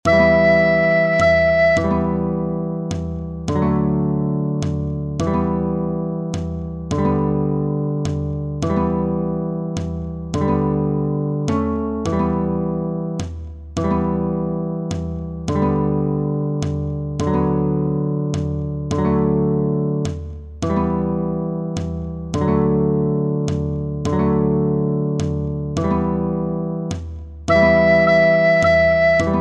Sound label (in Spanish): solo acompanamiento; Scarborough Fair; The sound file below is the one that contains the accompaniment alone.